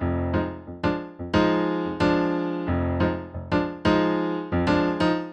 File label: Piano funk vamp